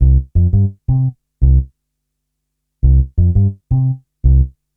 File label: Upright Bass, Drum & Bass; Fluid Dynamics